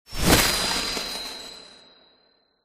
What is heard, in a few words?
Ice spell cast hit